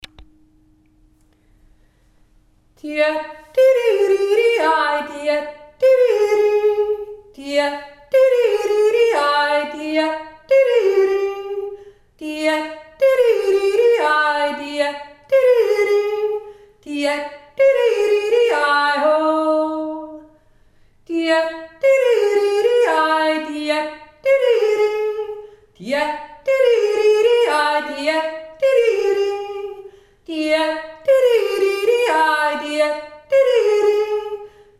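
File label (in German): Jodler; djeti di ri di ai, die ti di ri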